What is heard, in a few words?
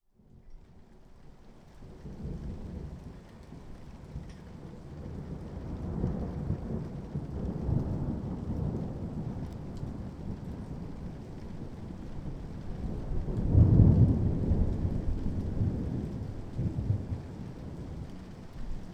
zoom h2 thunder; ambisonic